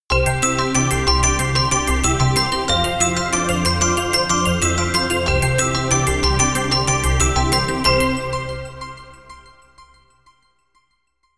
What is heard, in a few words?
Winter Music